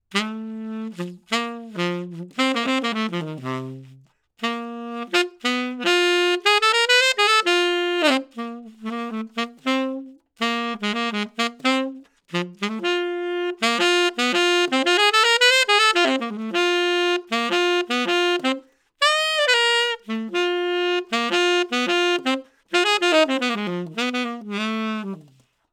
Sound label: SAX